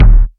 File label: hot kick one shot d sharp key; kick drum samples; one shots